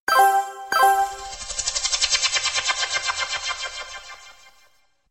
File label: Best sms tune